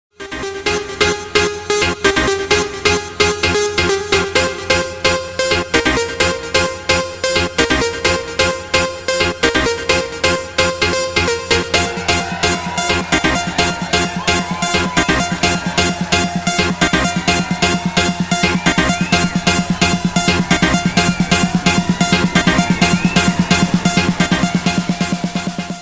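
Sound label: Melody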